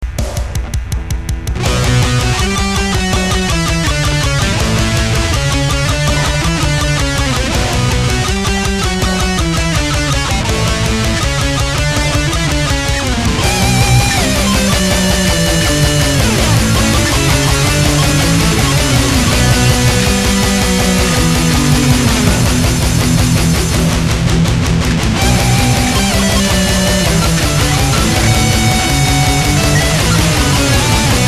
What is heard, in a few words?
melodic, guitar